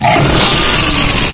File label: antlion guard pain